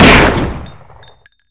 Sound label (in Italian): PISTOLA; kingpin; weapons